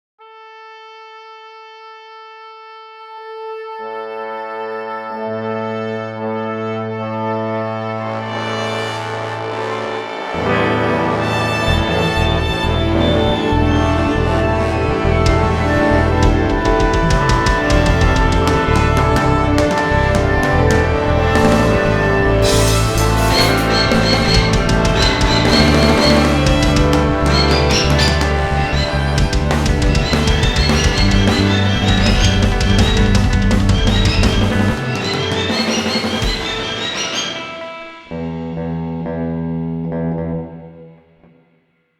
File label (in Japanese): チューニング